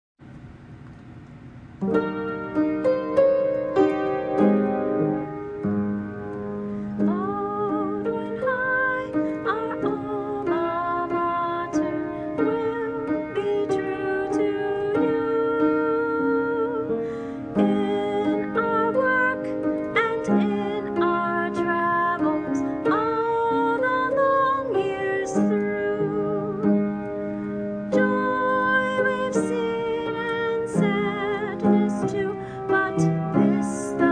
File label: alma mater as written